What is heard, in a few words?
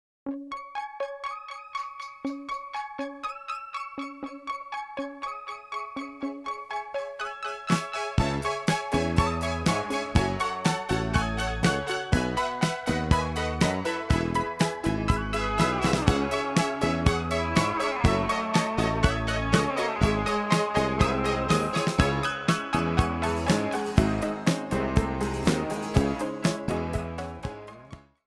Pop; Audio Quality Immersion Examples